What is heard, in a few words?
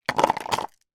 food drink ice cubes drop in cup; macdonalds cup